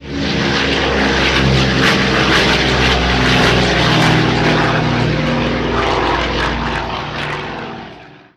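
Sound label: dc-6, aircraft; kubanskiloewe; simutrans; Pak128.Britain-Ex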